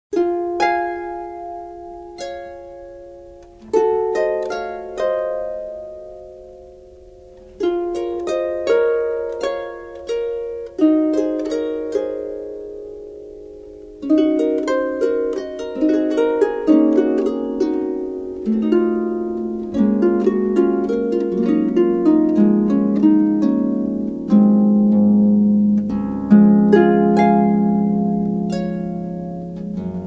solo harp debussy claire de lune

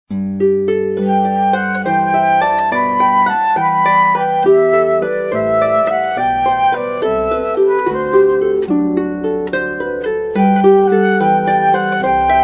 duo flute harp; bach jesu joy of mans desiring

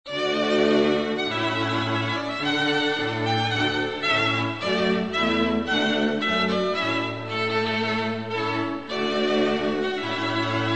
quartet 2 violins viola cello; purcell trumpet voluntary

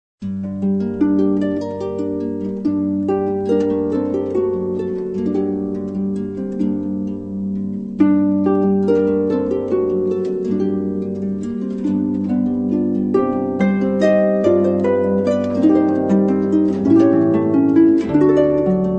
solo harp

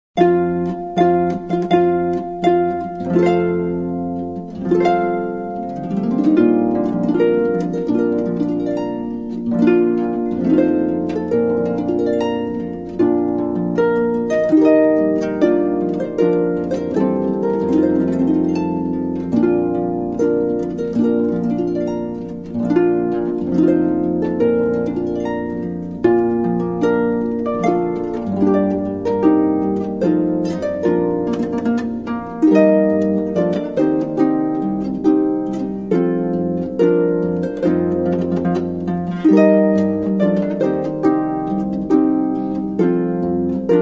solo harp; wagner bridal chorus from lohengrin

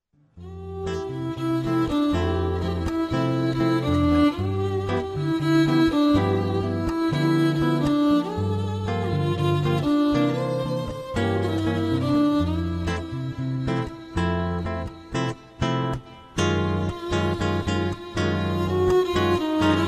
duo violin guitar

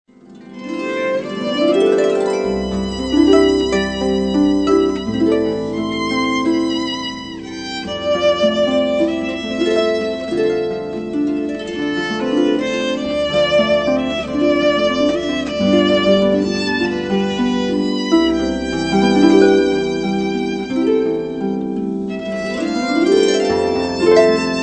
duo violin harp

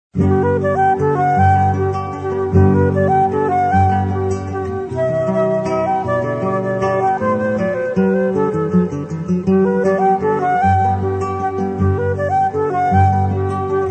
duo flute guitar; bach menuett

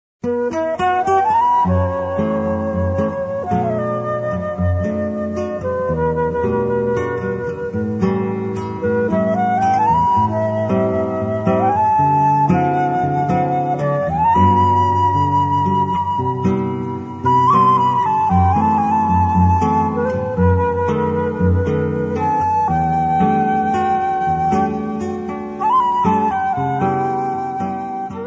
duo guitar soprano

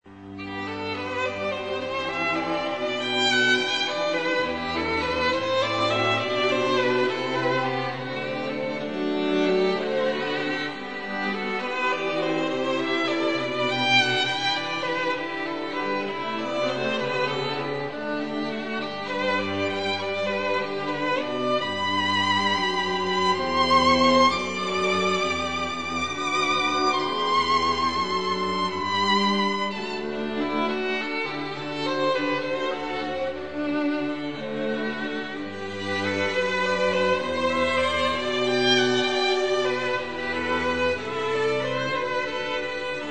quartet 2 violins viola cello; bach jesu joy of mans desiring

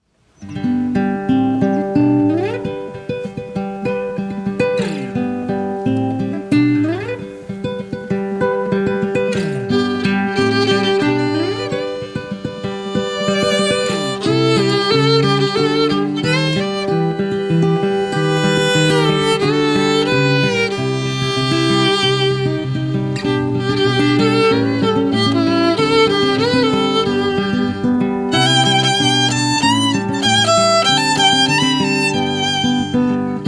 Guitar Violin Duo; Jason Sulkin Music

